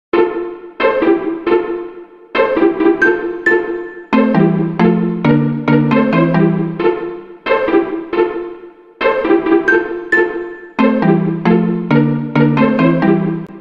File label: Infinix Ringtone